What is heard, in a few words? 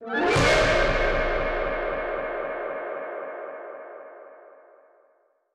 Park Dogs Brass